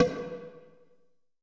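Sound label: FX BONGO HOP; AKAI S-Series CD-ROM Sound Library VOL-5; FX PERC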